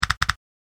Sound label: A Series Of Clicks; multimedia; presentations, pc games, computer applications (apps), web design; Andrew Fleming